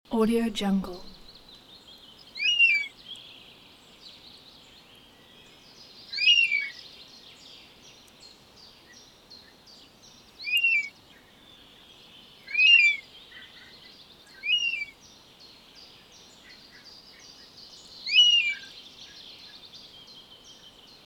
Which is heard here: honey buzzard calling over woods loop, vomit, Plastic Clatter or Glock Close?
honey buzzard calling over woods loop